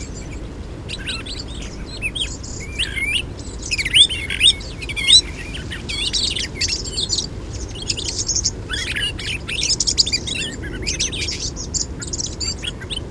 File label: Song Thrush Turdus philomelos